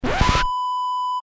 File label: record scratch